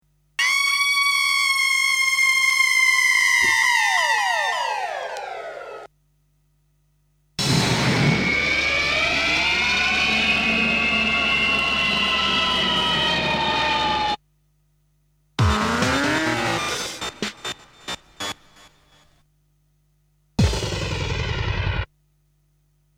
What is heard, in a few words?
Sound Effects; Kniteforce; 1000 Samples Volume 1